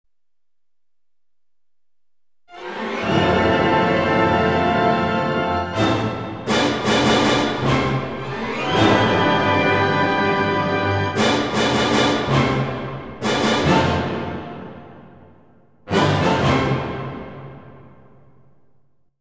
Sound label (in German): das grosse Orchester Finale